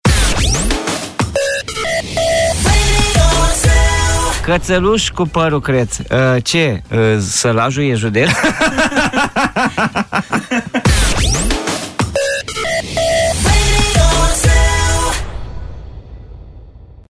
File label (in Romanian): Colind Salaj; morning zu